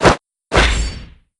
pose; decargo

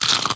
choco bite; smiles

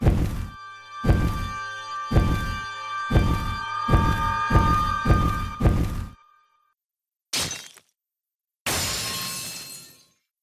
mandala break; amara

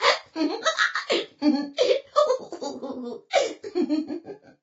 giggle

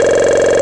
bubbles; cammy

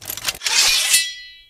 attack; barnham

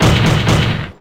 triple slam

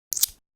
coin reveal; retinz

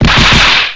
triple smack

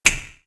snap; sherlock

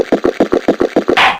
coffee slam

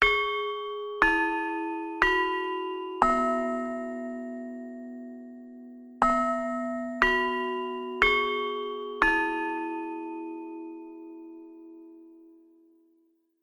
chime; yttd

